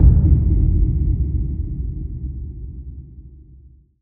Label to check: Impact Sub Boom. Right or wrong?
Right